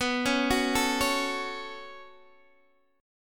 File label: B 7th Suspended 2nd B down Guitar Open D